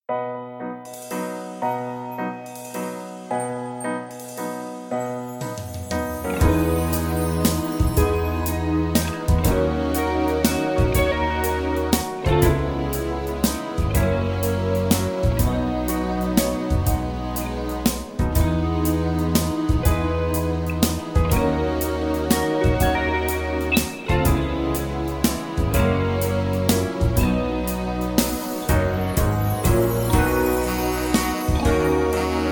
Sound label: B No Backing Vocals, backing tracks